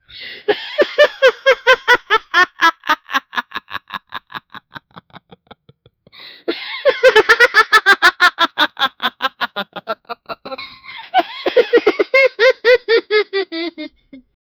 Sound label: a laugh with a two second